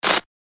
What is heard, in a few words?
TV channel static noise